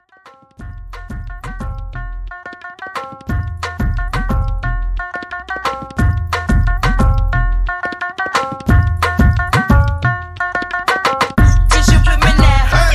Hype Scratch 89

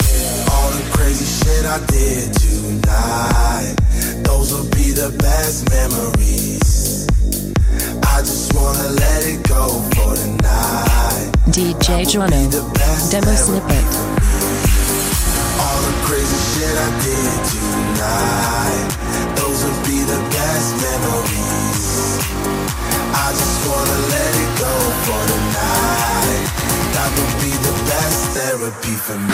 127bpm

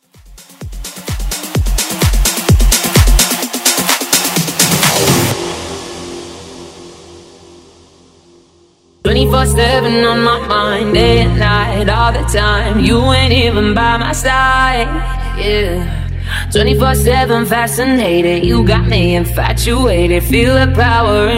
vocal edit

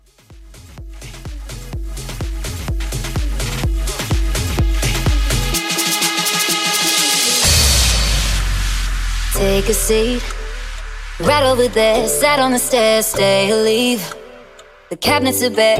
Bass House 128 BPM

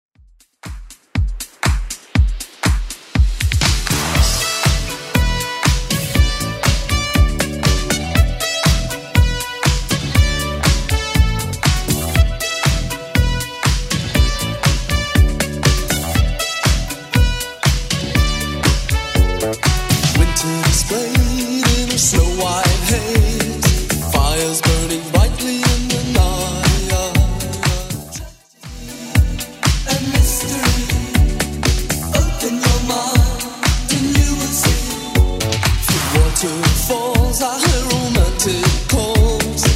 80s Club Edit 120bpm

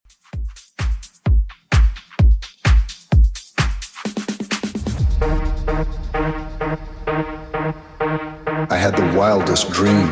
Top40 Redrum 129bpm

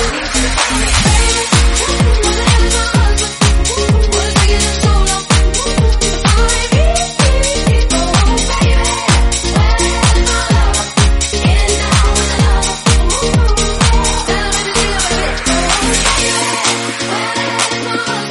127bpm Bbm

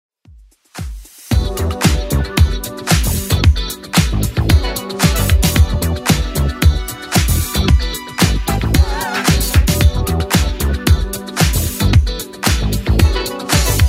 80s Club Edit 113bpm

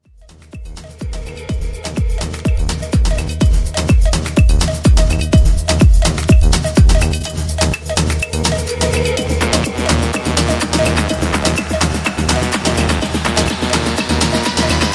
Afro Mashup, 125 Bpm

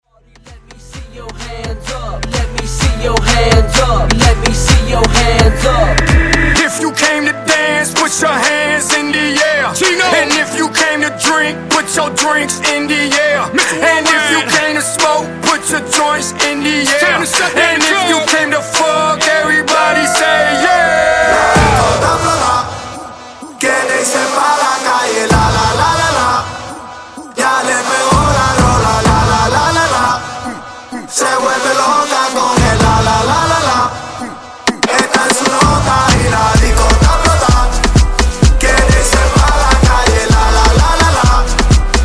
Hype Redrum 128 Bpm

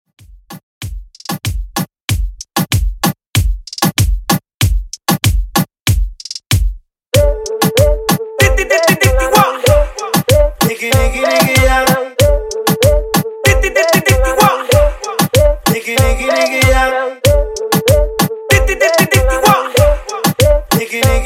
Reggaeton 95 Bpm

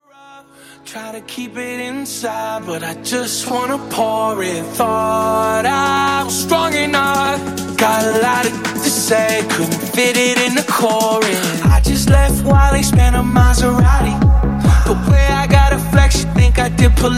Mashup; Remixfreakz

